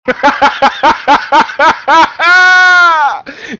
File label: extreme laugh